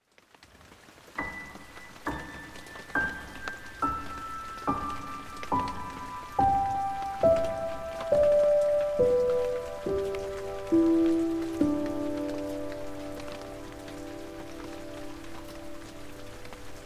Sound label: Piano, With Rain